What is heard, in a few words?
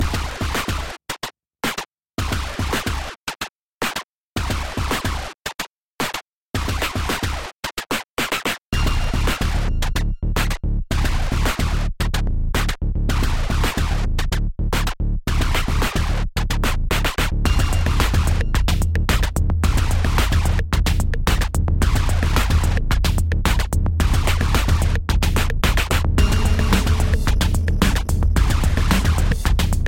loop